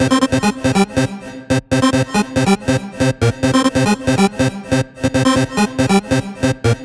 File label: Mod Synth, hard style